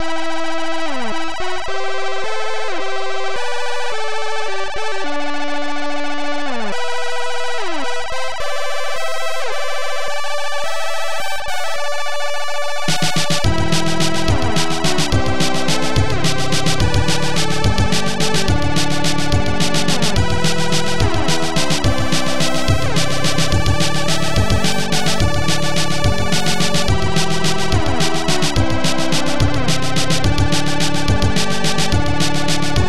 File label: chips and waltz